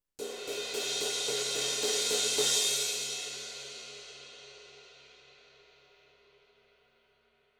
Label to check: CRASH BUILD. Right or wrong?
Right